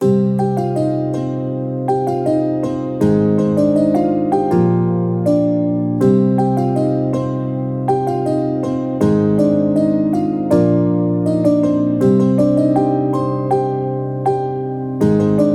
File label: Catchy Melody 150BPM; nikos ultimate midi pack